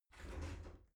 shower slide door slide short close

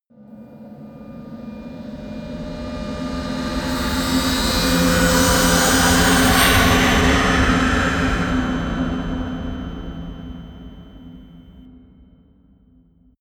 cold whisper, risers

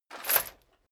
metal chain movement on plate single

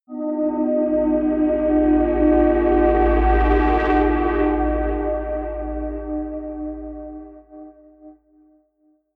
soft hum drone deep; musikelemente

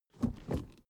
suv idle 1st gear change slow; verkehr transport; autos

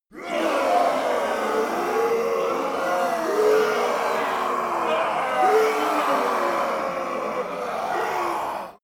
monster roar; schreie

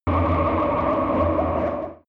extrinsic drone, energie

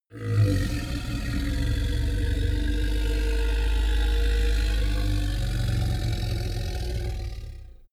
minotaur grunt long; kreaturen

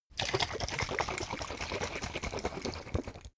bottle vodka shake foam; berufe; gastronomie

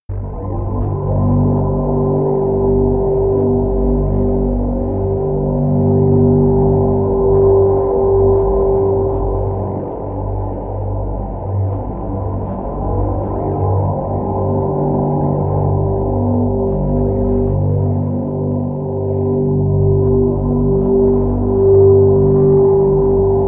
there's a storm blowing, music elements

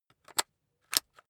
rock drill head attach regular; maschinen; bohrer; soundpool

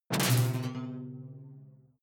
sci-fi alien splash detuned; swooshes-swishes

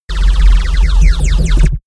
space laser blaster cannon; krieg-waffen; soundpool

